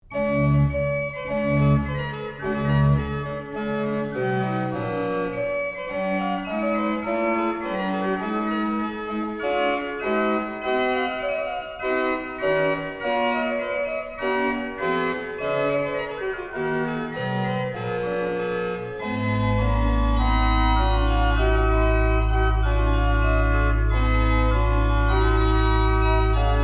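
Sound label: Tambourin for Organ; Carson Cooman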